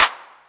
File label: Clap; Cymatics; Light Free Sample Pack; Drum One Shots